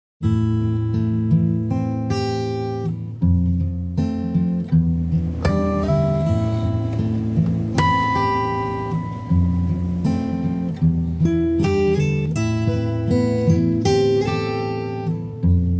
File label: Guitar Instrumentals